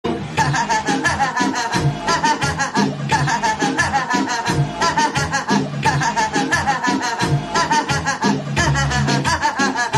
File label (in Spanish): risa